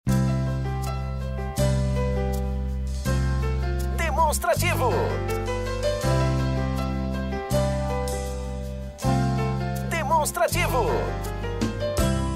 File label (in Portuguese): tema espera